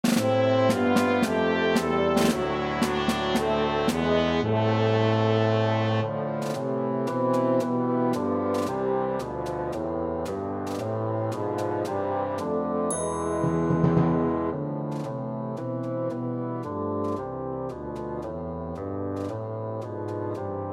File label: Bassoon Track with Melody; God Rest Ye